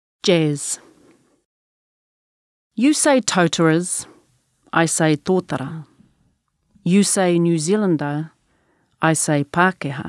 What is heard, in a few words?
Jazz